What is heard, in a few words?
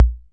bd, Korg KR-55; drum machines